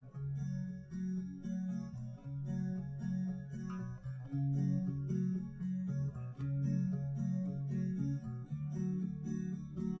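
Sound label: with drums, live rec; SiSEC11; underdetermined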